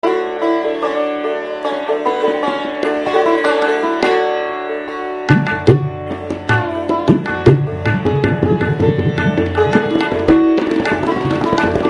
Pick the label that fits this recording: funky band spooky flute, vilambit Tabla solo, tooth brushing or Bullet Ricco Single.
vilambit Tabla solo